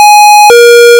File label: ALARM